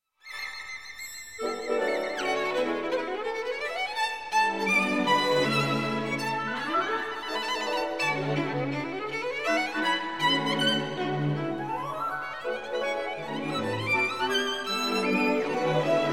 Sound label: rondo 2nd movement from violin concerto; moeran